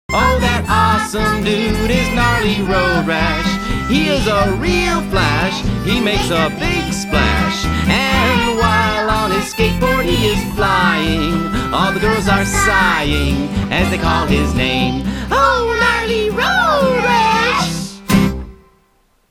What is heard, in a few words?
gnarly jingle